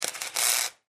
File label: Arc Welder; arcs & sparks